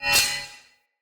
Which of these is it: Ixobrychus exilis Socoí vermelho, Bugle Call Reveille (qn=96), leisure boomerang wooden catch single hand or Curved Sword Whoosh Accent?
Curved Sword Whoosh Accent